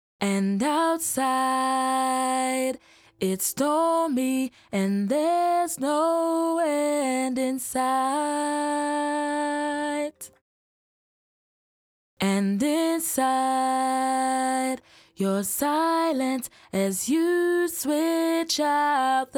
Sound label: vocal eq; 原始人声; Focusrite Liquid Mix